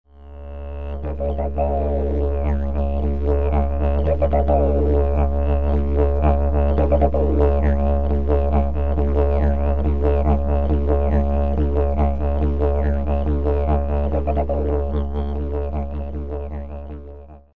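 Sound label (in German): Eukalyptus, Didgeridoo; Eukalyptus das zweite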